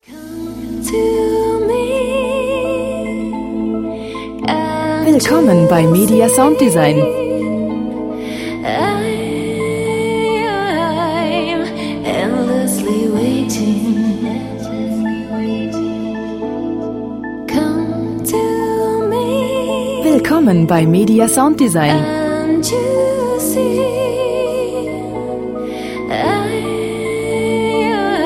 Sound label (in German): gesang endlessly waiting